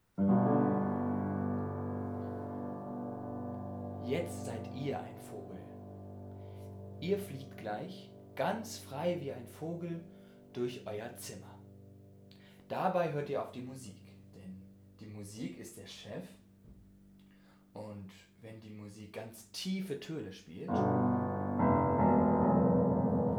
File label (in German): Vogelmusik; Eltern-Kind-Gruppen (Klangwiese)